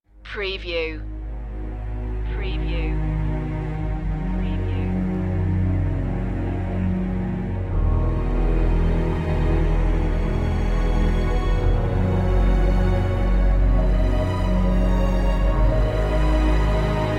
underscore

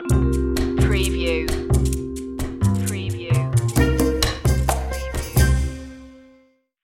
jazzy opener stinger

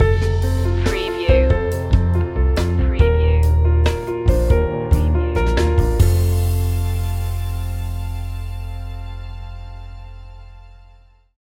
acoustic mood stinger

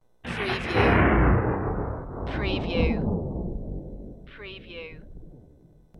Thunder

